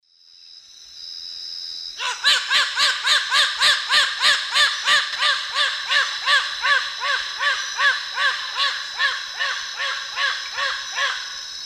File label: Amazona festiva, flight calls; S. America; Eastern Lowlands